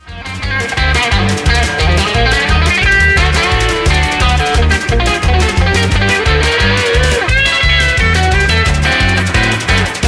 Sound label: Superpicking Polka